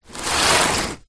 cardboard box large slide garage floor; foley; zapsplat

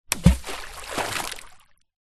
water splash rock med lake

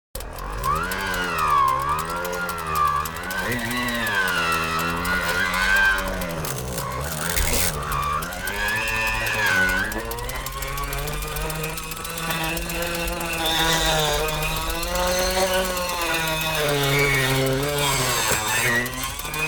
Bicycles, Wheels, Dynamo

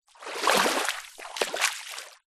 nature water shallow splash creek foot movement

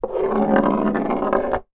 toy marble roll across wooden table fast

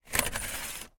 household blind vertical sliding open or close

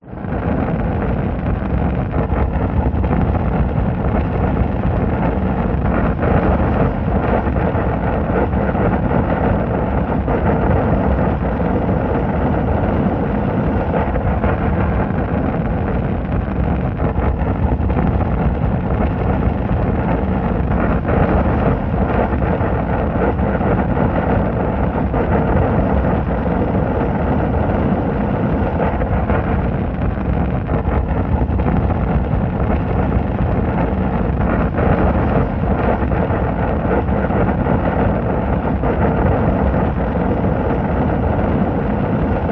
fire flames large burn wind blown

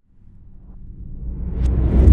Swell; bottlerocket

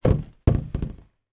wooden board lean against wall and bounce lightly; foley

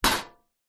household bbq hob lid metal close; zapsplat

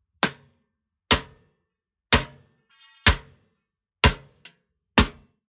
Just Impacts SIMPLE; soundbits

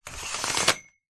household curtains open

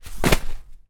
life jacket throw down on floor; foley

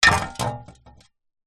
industrial shovel metal set down concrete ground

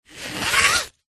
fabric nylon cloth movement in hand scratch; zapsplat

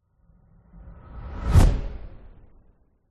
fantasy magic spell fly fast past whoosh; zapsplat

